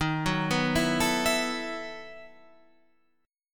E Major 7th Suspended 2nd Suspended 4th D sharp

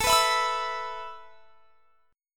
A Suspended 2nd A; Asus2; strummed

